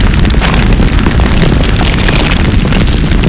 flamethrower; hurrican

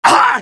damage; mohaa; dialogue

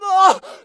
hit, pain; mohaa

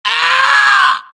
death, Axis; mohaa; Mission 1

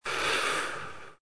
cigarette exhale; mohaa

